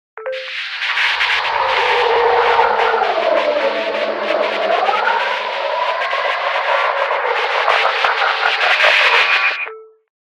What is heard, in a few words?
Monster Broadcast; Events; DynamicEuropa